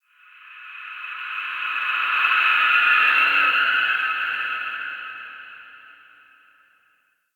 Shriek; Ambience; Crypt; Champion Crypt

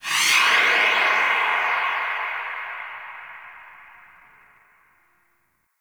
Town Steam Release; Ambience; Warframe; Duviri

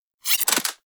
Pistol Mech; Warframe; Tenno; Sybaris Pistol

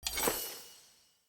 Player Short Sword Recall Local; Weapons; Swords

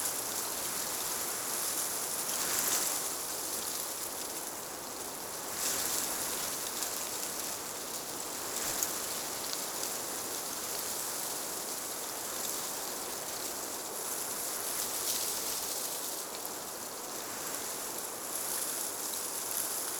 Tree Wind Loop; Ambience; Props; Warframe; Duviri

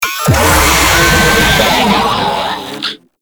Player Spotted, Vocals; Warframe; Proto Infested; Babau

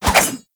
Pole Arm Spin Whoosh

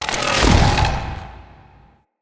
Galvanic Button Power Down

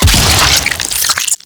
Infested Eris Cyst Explosion Single; Destructable Cysts

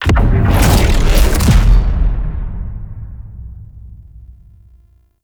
Hub Stinger; Fallen From Heaven

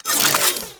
Finisher Parazon Unequip; Warframe; Kuva Lich; Finishers

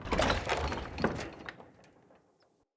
Wood Double Door Open; UnderCity

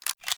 Pistol Reload; Warframe; Tenno; Tenno Bard Pistol

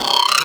Pistol Reload; Warframe; Sapient

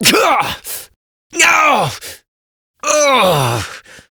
struggle long adult; New War; Adult Operator

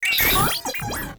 Tank Airburst Chirp Spawn; Enemies; Weapons; Lasrian Tank; Warframe

